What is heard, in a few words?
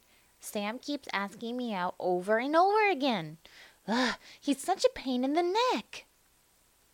pain